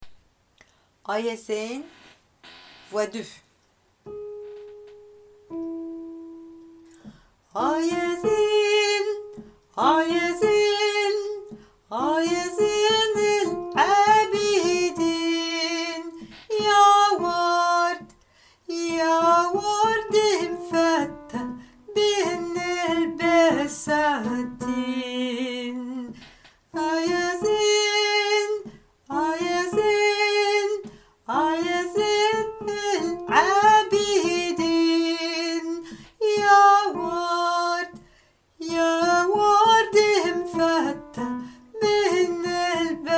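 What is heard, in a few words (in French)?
ah ya zen voix II mezzo baritone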